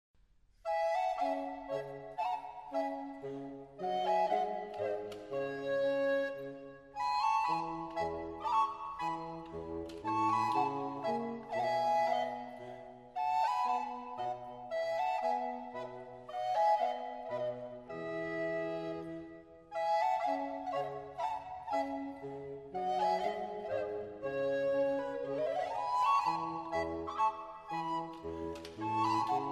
Concerto in D minor for 2 violins 2 traversi 2 oboes bassoon strings & bc